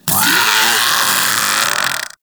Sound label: Vocal Death, Infested; Splitter Split Top; Grey Strain